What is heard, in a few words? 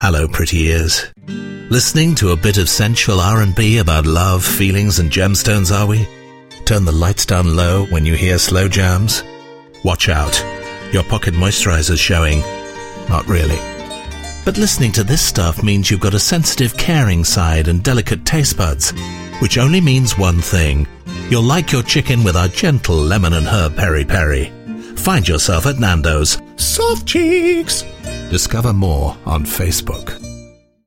nandos rnb